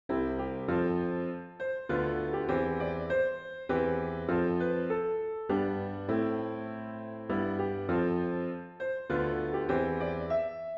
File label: piano only; O God Beyond All Praising